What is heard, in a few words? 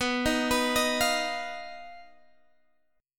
B Major Flat 5th B Guitar Half Step Down